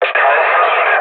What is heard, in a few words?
ambient battle; World Of Warships